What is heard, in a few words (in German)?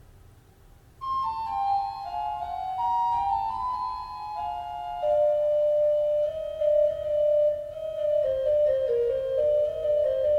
Pfeifenduett; Josef Hofer